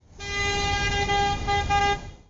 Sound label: horn; DeadlyRace